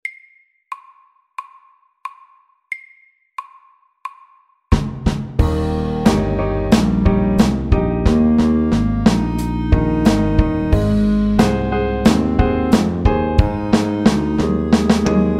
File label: Clarinet blues SLOW 90